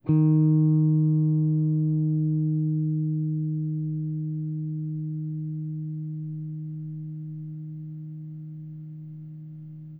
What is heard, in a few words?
Happy Guit